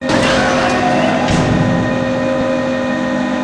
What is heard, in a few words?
door